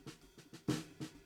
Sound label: BD Out